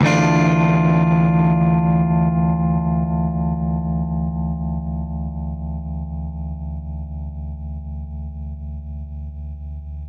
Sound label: Baritone Chord D min add9, guitar chords